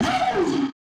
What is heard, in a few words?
VOX wooo